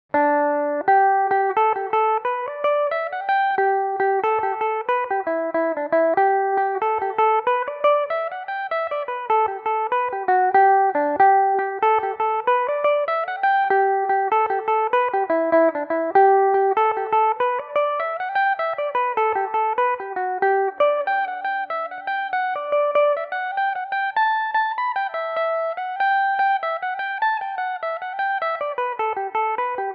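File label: G major, Irish mandolin; john blessings delight; belmuse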